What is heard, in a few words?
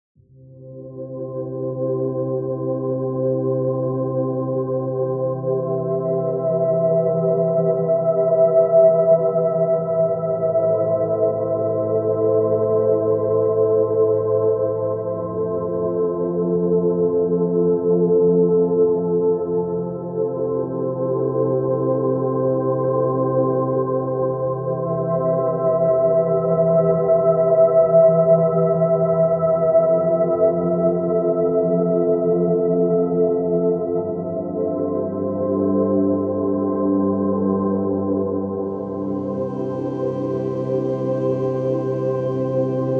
forest melody background music for meditation and yoga; 20 minutes